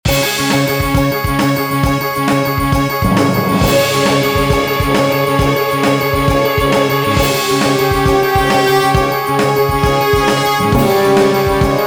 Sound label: flying loop w drums